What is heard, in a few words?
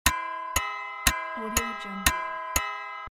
christmas clock